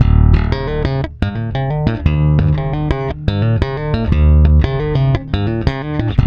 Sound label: PWR E, Bass, PWRSTRUM; Spectrasonics; Bass Legends; 128 PWRSTRUM